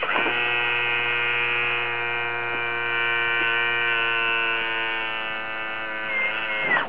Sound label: gear up